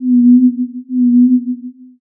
owl

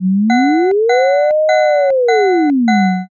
firetruck

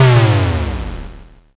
explosion m

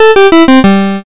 gameover

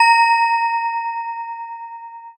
handbell

